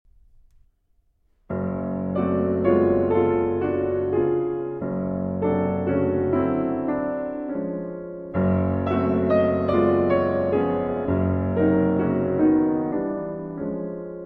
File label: Prelude in F-Sharp Major; Winter II.